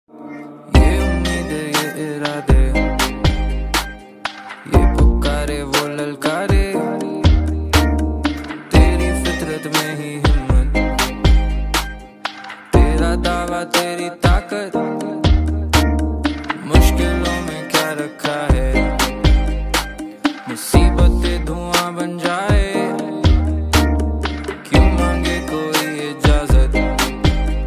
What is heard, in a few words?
ringtone; bgmi mobile india